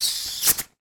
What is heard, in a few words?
lid unscrew on fizzy drink bottle